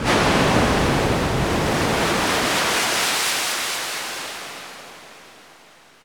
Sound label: SFX, synth atmos & fx; Dream Zone